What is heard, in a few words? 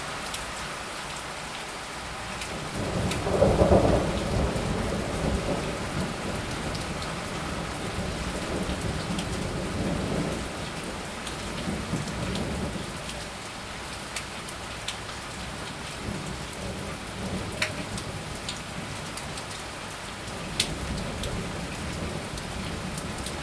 city storm with distant thunder